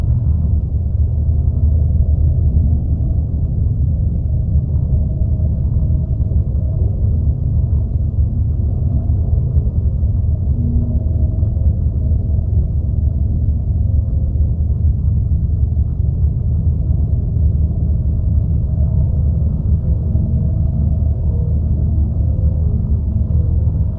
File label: titan ship hum; apocalypse